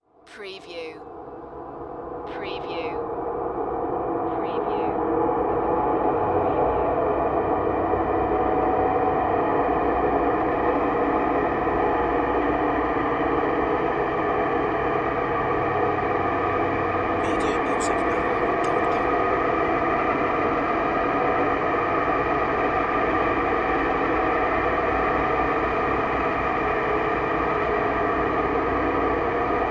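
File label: Production Element Bed Airy; sound-effects-bible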